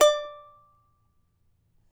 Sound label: charango D4, guitars; Spectrasonics; Hans Zimmer Guitars VOL-2